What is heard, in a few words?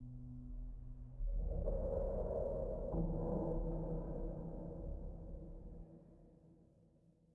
sting metal groan; Rust